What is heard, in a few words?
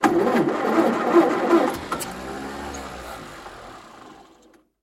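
sounds of car starter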